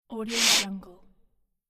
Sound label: sniff